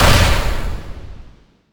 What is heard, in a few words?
bomb explode; Crypt of the NecroDancer